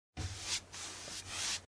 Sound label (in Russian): zvuk treh mazkov kisti; Звуки кисти художника или маляра